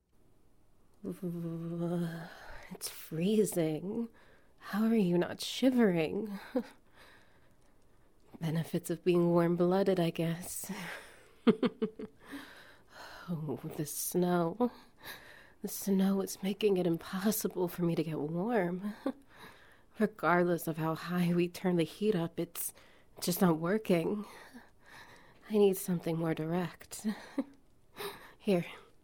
Ambience SFX Wet Noises ORAL ONLY; Your best friend, Ami

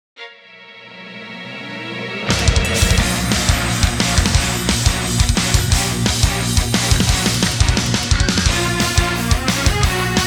Metal

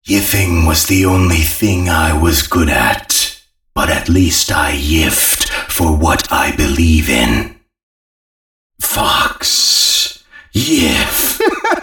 gray fox yiff sfx

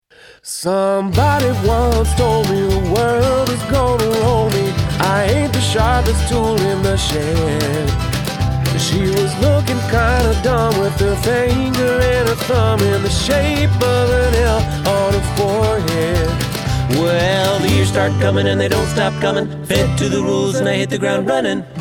But Country ringtone

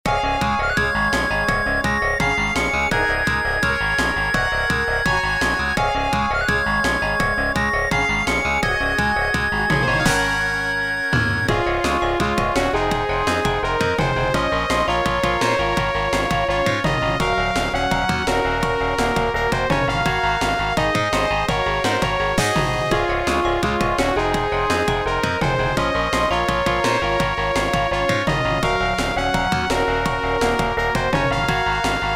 Sega Genesis Remix; TwinBee Rainbow Bell Adventures; VGMTrans

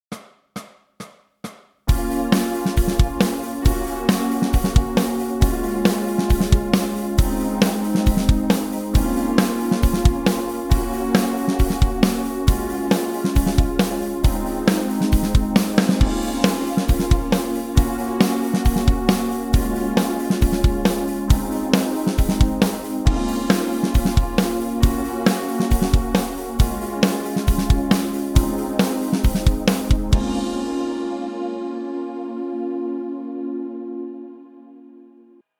VI IV I V Progression, Backing Track

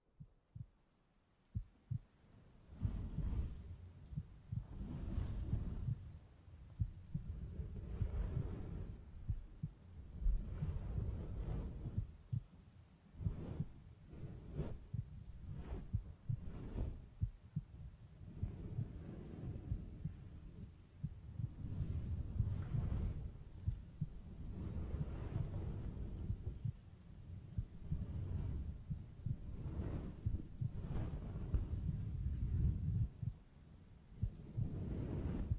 Heartbeat

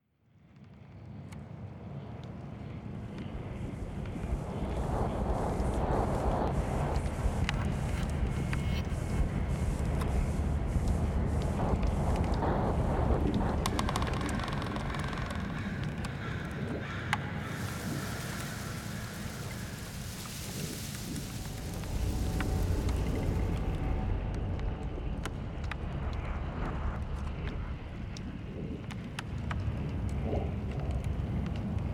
Forest AMB Only No Whispers

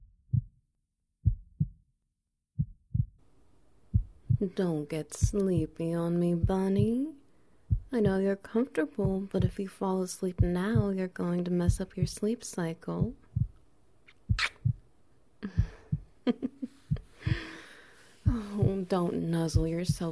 Heartbeat - Tail SFX

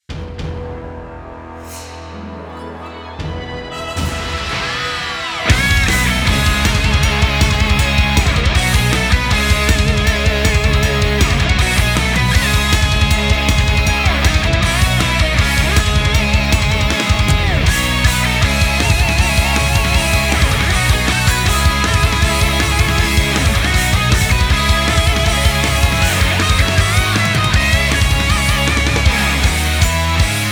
Instrumental Arrangement

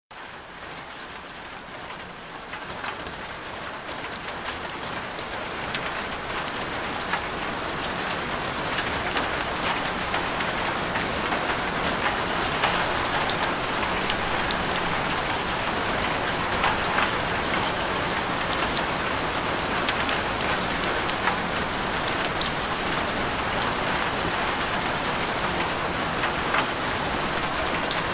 Rain Sounds; Be Extremely Suggestible for One Hour

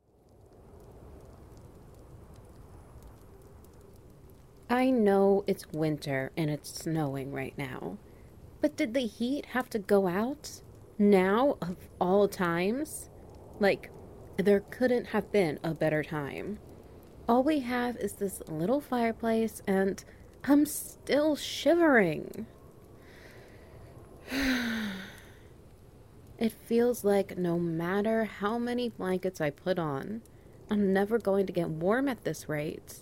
Cuddles SFx; Tsundere

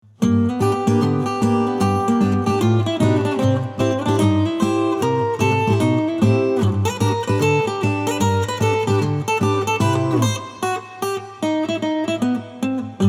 Acoustic

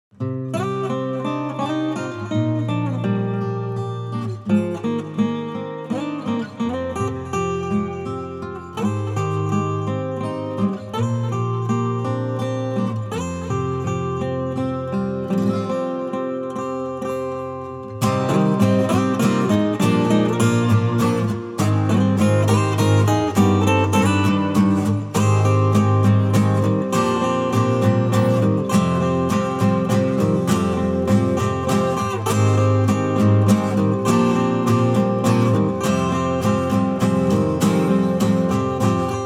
Acoustic

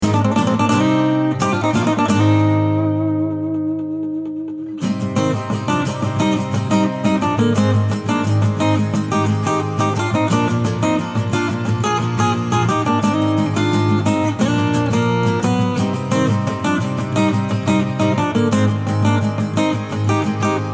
Acoustic; MMX2; Zeros Theme

